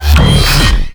Sword Slam Charge; Vehicles; Abilities; Warframe; Nechro Mech

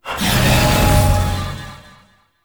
Mirror Portal Confetti Burst; Warframe; Duviri Murmur

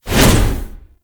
Hammer Whoosh; Warframe; Veilbreaker; Sprag

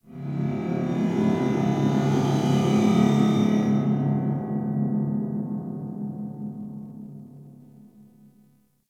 Galleon Low Ship Amb; Ambience; Warframe; Grineer Galleon

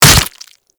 Berserker Barrage Hit Flesh E; Warframe; Warframes

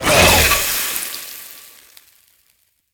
Infested Scythe Charge Attack

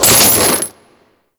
Chains Beam; Condemn; Warframe; Priest

